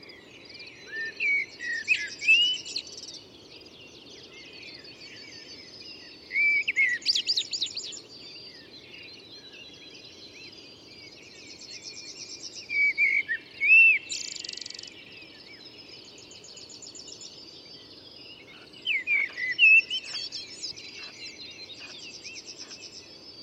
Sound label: turdus merula